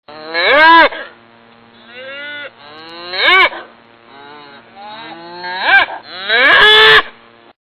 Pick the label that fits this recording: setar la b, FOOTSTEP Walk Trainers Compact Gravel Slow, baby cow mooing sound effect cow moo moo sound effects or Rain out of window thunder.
baby cow mooing sound effect cow moo moo sound effects